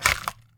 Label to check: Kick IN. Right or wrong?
Wrong